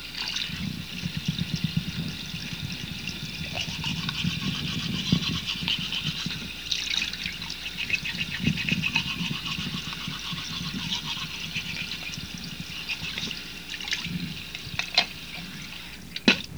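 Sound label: brush teeth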